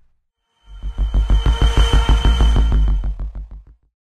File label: pod pass by; ambient; outro; source engine; episodic